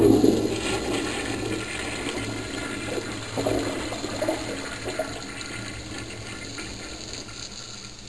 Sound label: Pressure Toilet Flushing